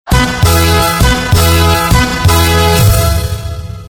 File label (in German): narhalla tusch